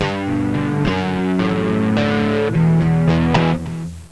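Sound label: GUITAR RIFF; MUSIC; INSTRMNT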